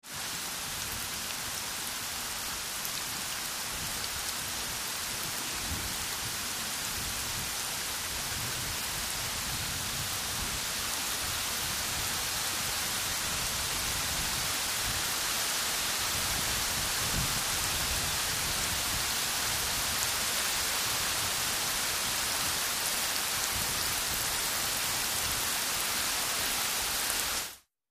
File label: Rain Increasing, ext